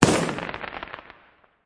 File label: firework